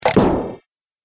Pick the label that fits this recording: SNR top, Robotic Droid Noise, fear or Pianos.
Robotic Droid Noise